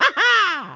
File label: haha; mario